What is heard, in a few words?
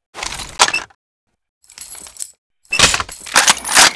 30cal world reload